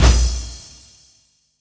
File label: Tom, Percussion; Vengeance Samples